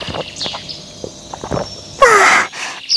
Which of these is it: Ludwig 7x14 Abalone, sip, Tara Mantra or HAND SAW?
sip